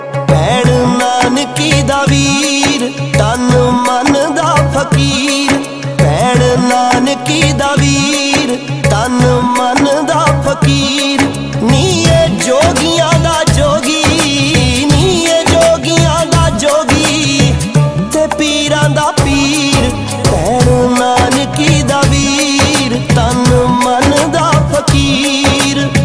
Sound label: Waheguru Ringtone